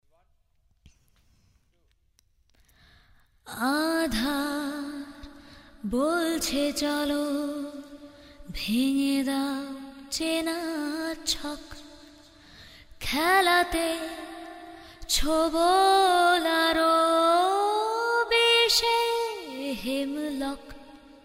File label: Crispy-Sad Version; kolkata